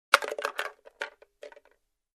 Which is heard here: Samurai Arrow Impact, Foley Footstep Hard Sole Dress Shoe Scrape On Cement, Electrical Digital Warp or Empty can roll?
Empty can roll